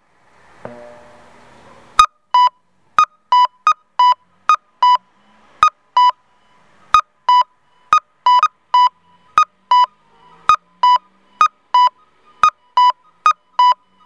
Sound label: kakko, onkyo kosaten; tokushima; miyoshi; nagoya U-TK-1; awagin ikedashiten mae